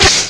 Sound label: impact; thexder